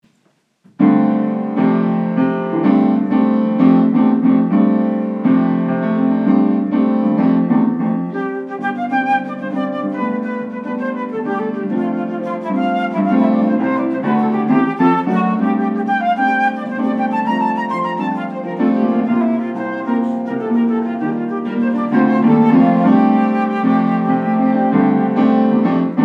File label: jig, flute harp; gs jig